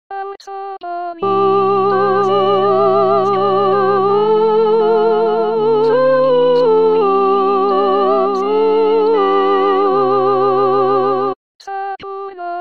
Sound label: aurtxo seaskan sopranos